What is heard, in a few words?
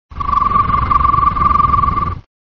Night Bird